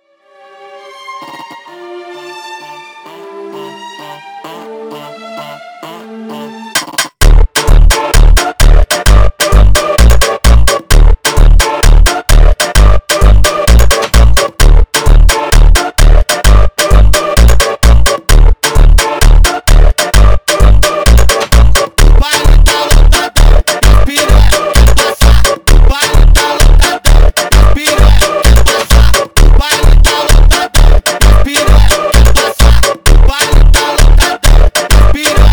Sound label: Funk Orquestral